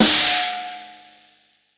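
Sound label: FX snare drum, drums acoustic; FX KIT; USB Soundscan vol.10; AKAI; 90s sample CD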